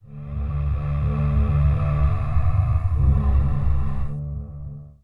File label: Voices